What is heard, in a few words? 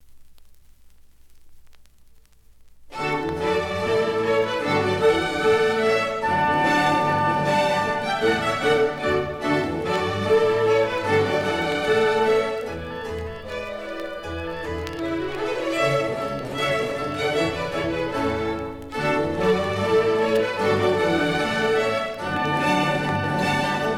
Gavotte; Bach; Suite No.4 In D Minor, Bwv 1069